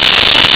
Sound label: shock